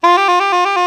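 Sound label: trill low F-G, soprano sax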